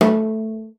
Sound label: CELLO FINGER A3; Xsample VOL-5